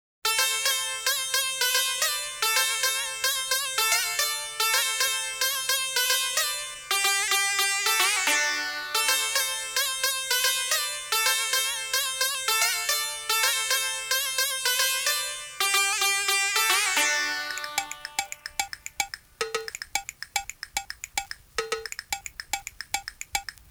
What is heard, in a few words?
Arabian (Instrumental)